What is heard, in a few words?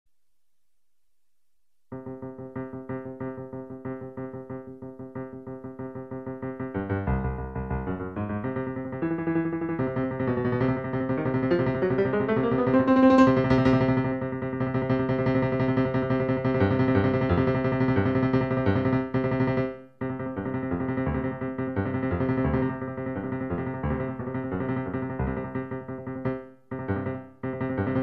piano solo music, modern